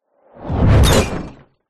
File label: sound design shockwave whoosh into glass and debris smash